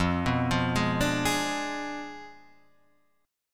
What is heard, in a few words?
F Diminished 7th F down